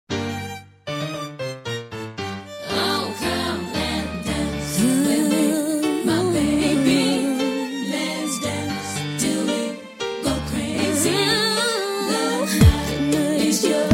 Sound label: Cha Cha Cha